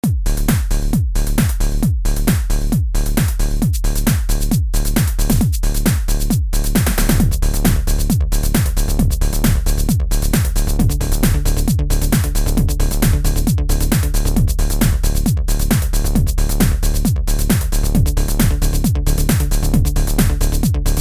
Tenori Groovebox

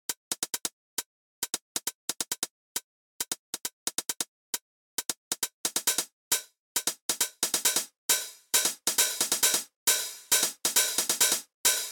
Roland TR-09 HiHats

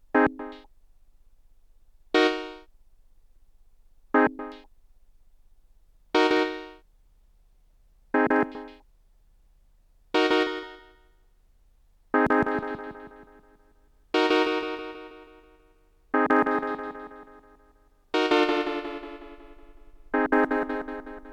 Delay; Bullfrog

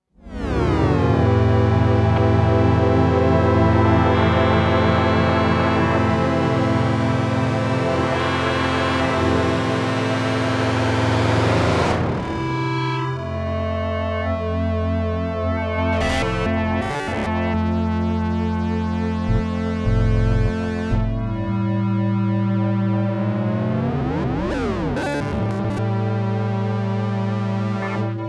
Montage

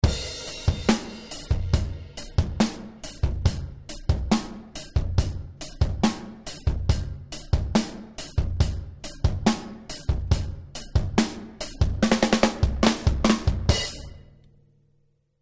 Elysia Karacter Drums Extreme

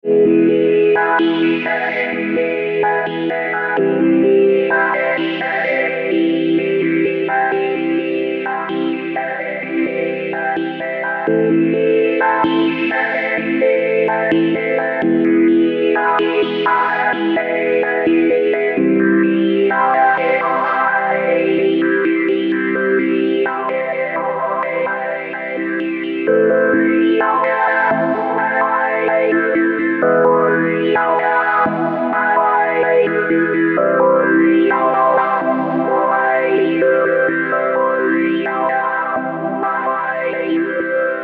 wovel filter

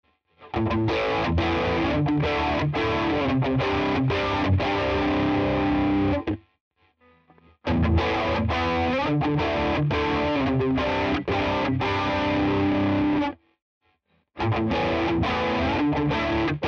Axis Flanger crunch all on 12 PreDelay 5 Settings

